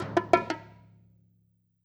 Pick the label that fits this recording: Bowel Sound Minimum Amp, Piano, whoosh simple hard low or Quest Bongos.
Quest Bongos